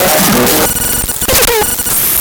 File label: Distorted Reality; Spectrasonic; DIGITERROR